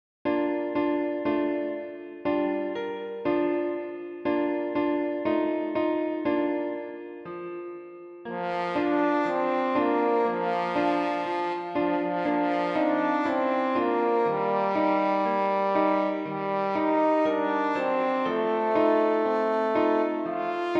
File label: Jingle Bells F Horn